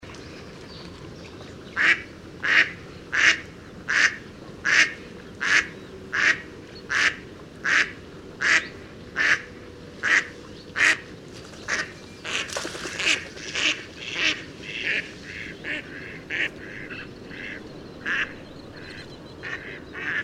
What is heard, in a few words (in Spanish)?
Ánade azulón Anas platyrhynchos